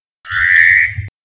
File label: omao call